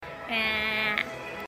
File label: ehhhhhh; meloboom